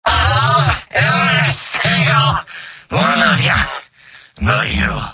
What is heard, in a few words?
cheers; demoman; video games; source engine; tf; mvm